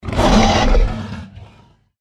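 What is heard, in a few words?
deathclaw power attack; npc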